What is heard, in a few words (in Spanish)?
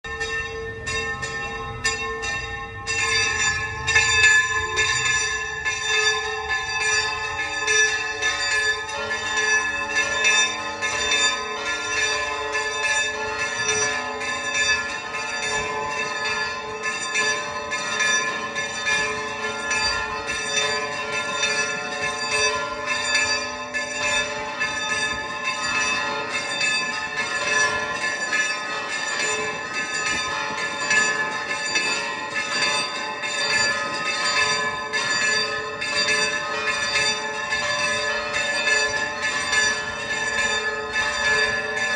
campanas; campanario